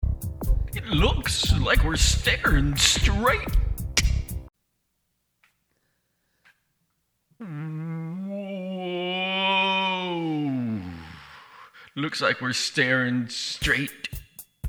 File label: Soundcheck